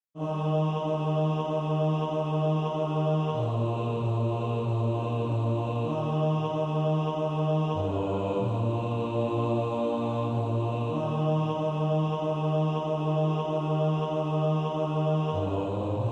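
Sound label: bass; On Jordans stormy banks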